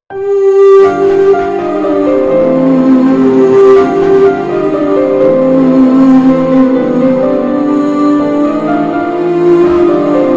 sad bgm emotional bgm; raayan

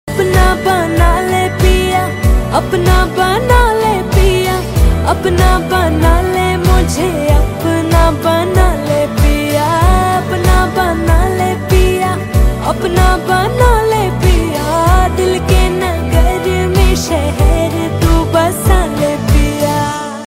female ringtone

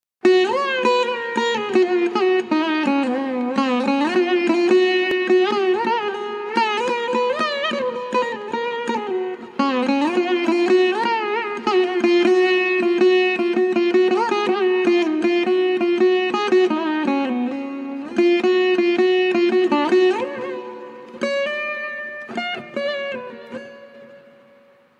bgm

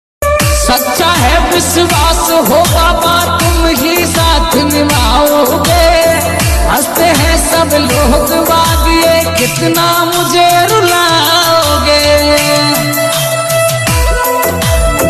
bhakti ringtone; khatu shyam; baba

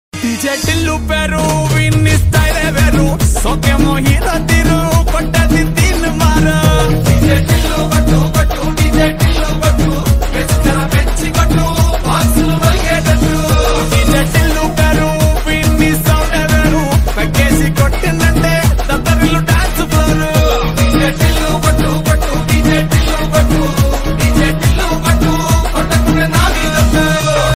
telugu trending ringtone

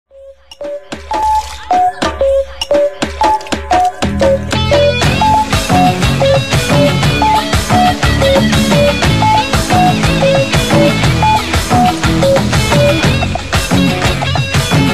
ringtone lovely ringtone; theeratha vilayattu pillai

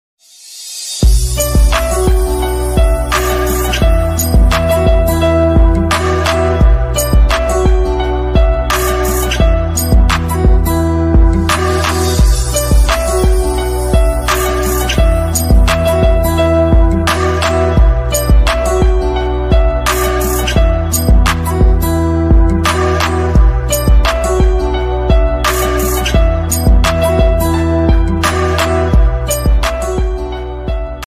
instrumental ringtone punjabi ringtone bgm

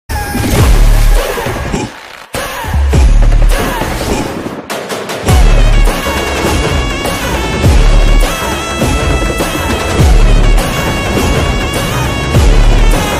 movie bgm ringtone, movie theater bgm ringtone; gopichand; bhimaa

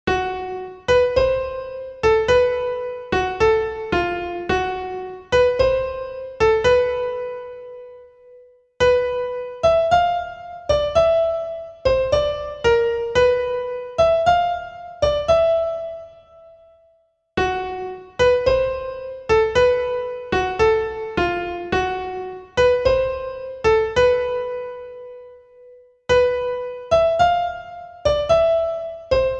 villain bgm on piano; damarukam movie

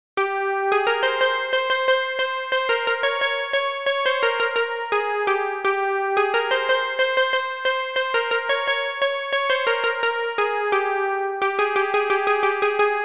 bgm piano; aavesham; thurupu cheetu

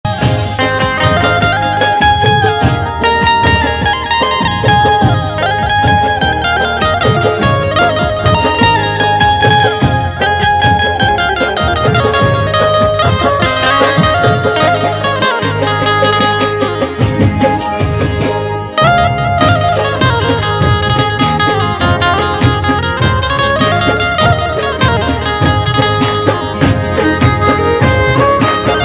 Gujarati ringtone; ગજરત બનજ

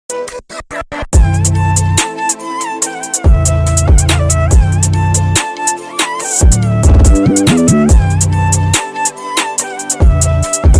indian instrumental; mob

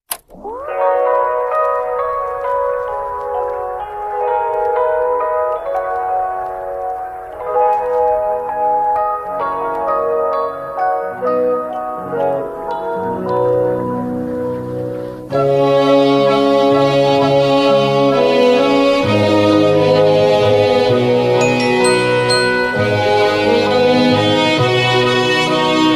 Relaxing BGM

